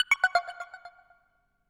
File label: Alert